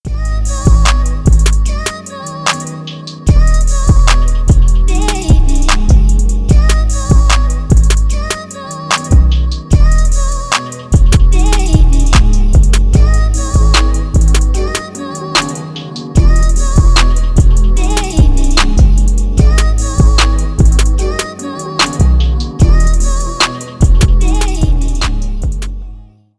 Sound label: instrumental